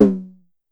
Drum X Tom, Pearl

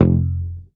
Bass, MUTES

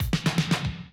110, Classic Drum Loops

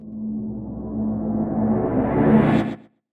Reverse Fx; musicradar

